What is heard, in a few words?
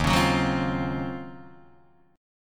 Eb Minor 13th Eb down Guitar Open D